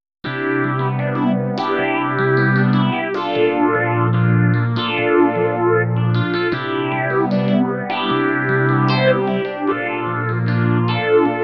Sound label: phased out; briandc synthv1